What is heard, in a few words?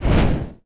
Shooter Shot; game audio; EnemyShooter; MiSide